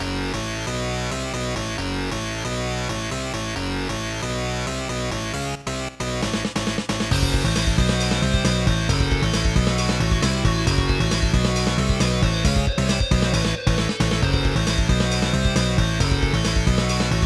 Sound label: industrial; Jack 'n' Hat